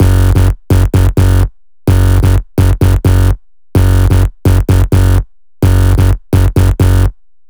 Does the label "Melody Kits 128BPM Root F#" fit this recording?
Yes